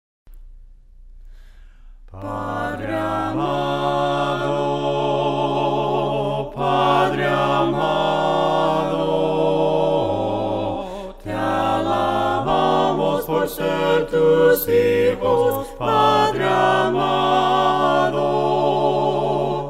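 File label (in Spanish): PADRE AMADO VOCES, himnos a capella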